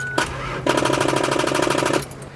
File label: starter clicks rapidly